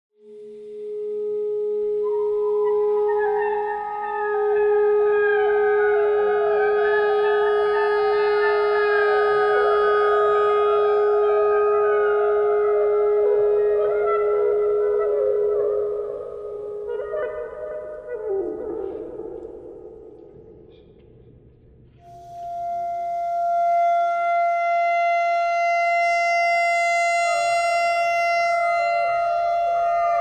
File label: clarinet notes e thread noise; clarinet geese